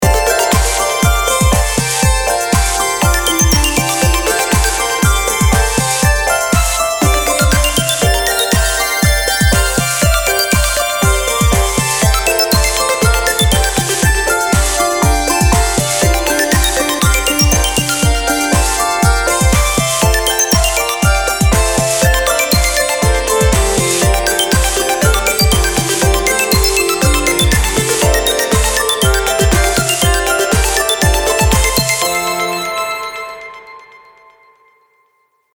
synth texture

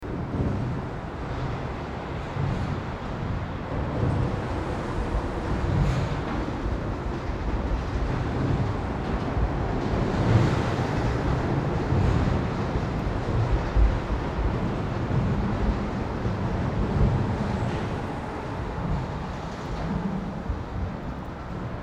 suspension bridge

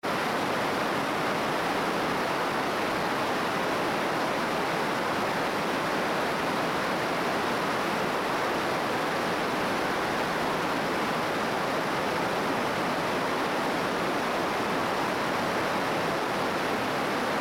river

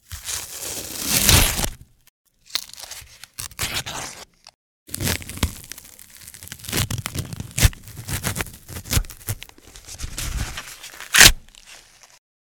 corn

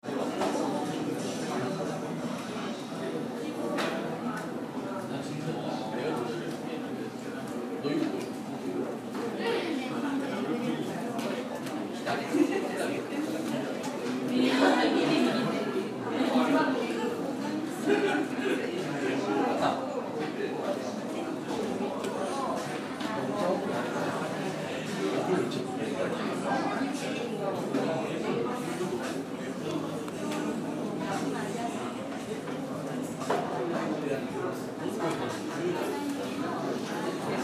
cafe

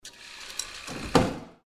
ticket gate

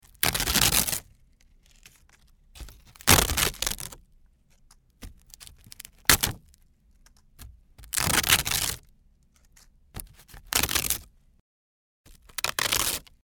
nori yaburu

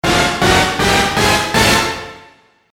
JINGLE pity

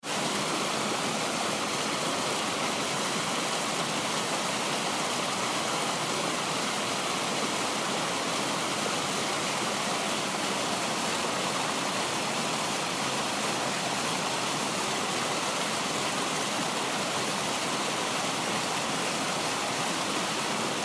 water

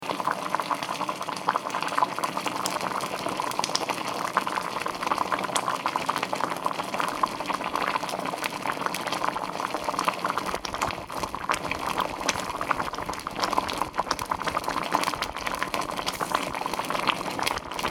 red beans boiling

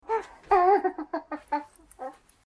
baby laughing

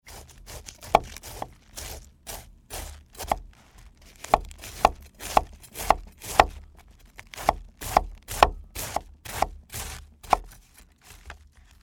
cutting cabbage

